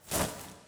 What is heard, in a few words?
Vase Angelwings Flap